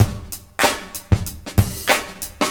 Drum loops Boombap

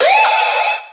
whoop male, voice; ooh ahh; ho hoo woo

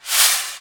smashcut; Crash Bang Boom

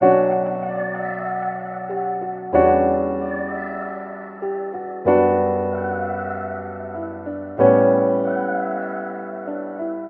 piano and keys, Sad; loops

